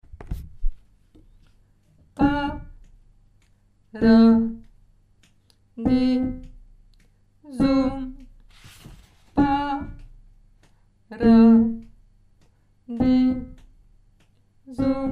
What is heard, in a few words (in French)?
numero 4 lettre G basse